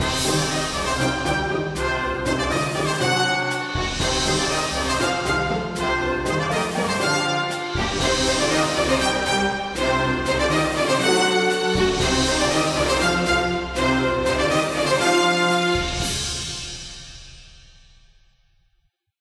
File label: Melody Pizz; Military; tintin reporter cigars of the pharaoh